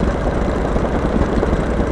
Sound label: zeppelin; Crazy Machines; Играет вся семья